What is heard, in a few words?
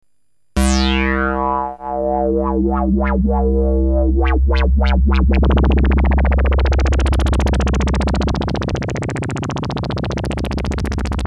lfo vcf